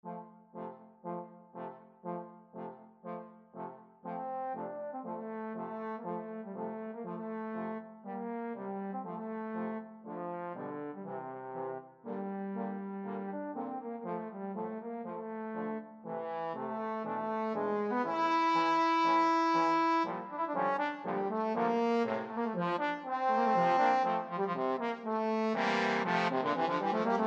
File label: toreador, trombone quartet